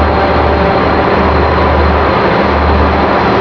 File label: Dr16 run; finnish